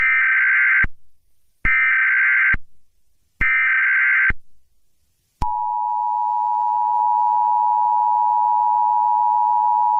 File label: eas alert usa